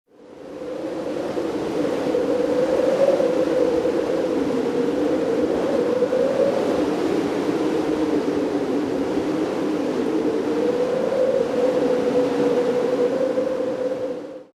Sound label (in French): LE VENT